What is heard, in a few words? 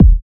KICKS; UNDERBELLY